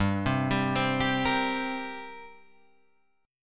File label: G Minor Double Flat 5th, Guitar, Open G; chordshape; down